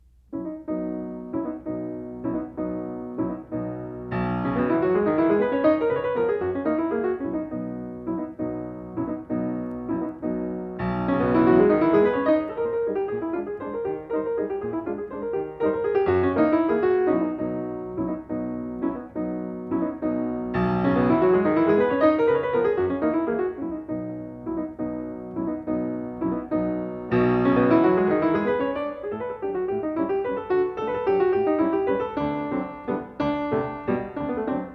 Mazurka in B major; Frédéric Chopin

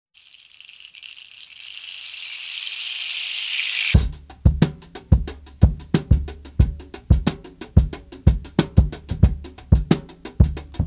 African Rhythms (instrumental)

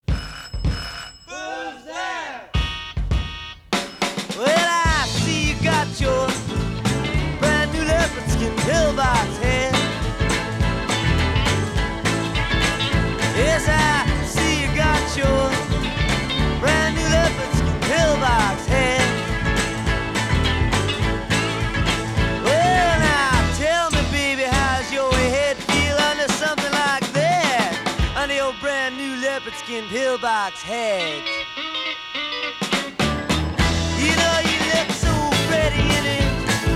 breakdown

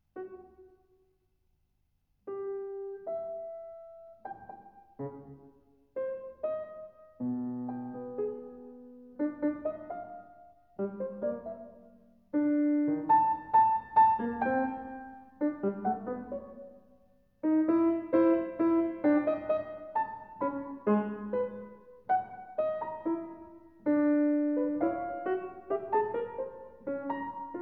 Piano Sonata No.1; Op. 12

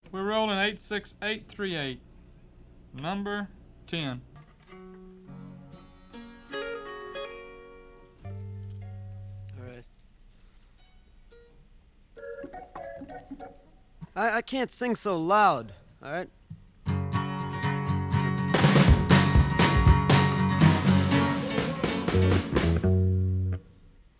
false start

